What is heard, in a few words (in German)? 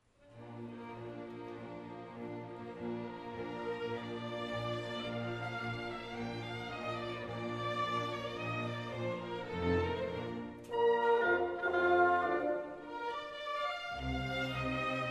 mozart klavierkonzert; Frühlingskonzert 2009